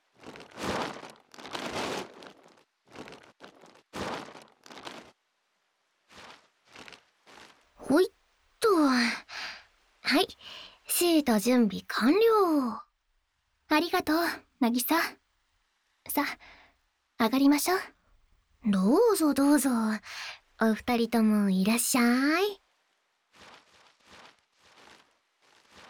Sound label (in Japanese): 日焼け止め 塗り; 浜辺で待ち合わせ